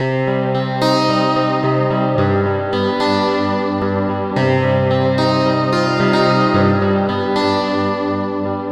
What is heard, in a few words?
Spook Guitar, Guitar Synths; Dr. Fink's Funk Factory